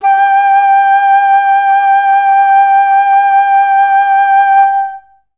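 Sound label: alto flutes sustain G4; Best Service; Peter Siedlaczek's Advanced Orchestra; AFS LONG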